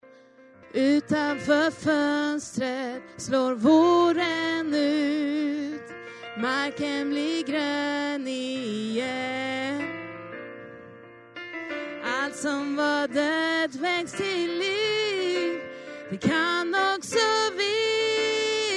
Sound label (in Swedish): sopran